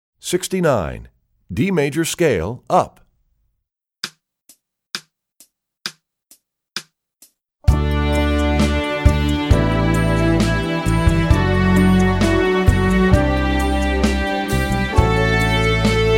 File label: D Major Scale Up, Violin